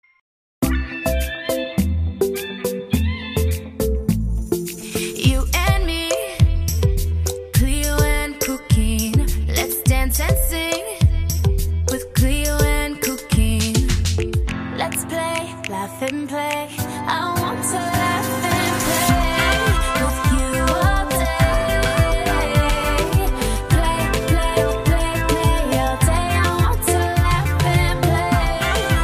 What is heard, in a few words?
Laugh and play